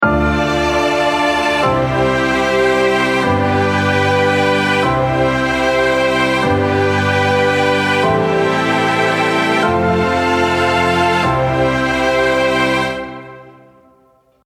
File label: Emotional Beautiful Bounce, piano